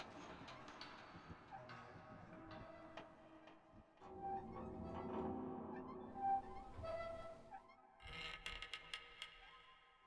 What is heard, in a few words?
Ambience Plane Crash